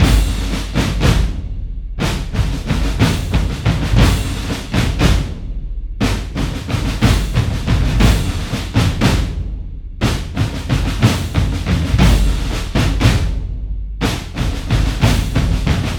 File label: Drums; For Honor